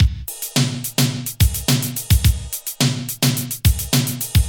107 bpm old school drum loop b key, Free drum beat - kick tuned to the B note